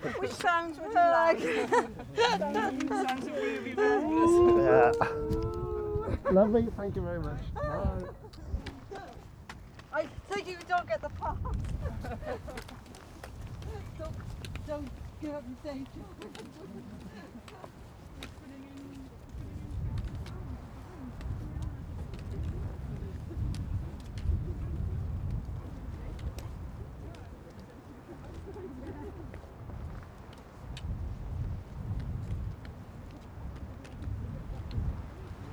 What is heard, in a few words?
mountain ramblers walking away